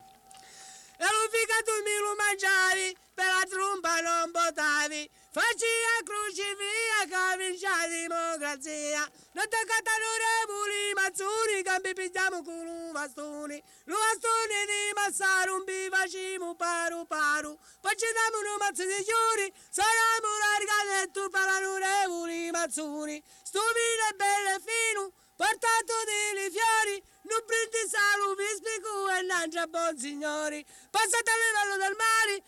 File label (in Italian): canto a dispetto; Cardeto; Mammola; Cinquefrondi